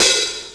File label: Misc Hat; EMU MoPhatt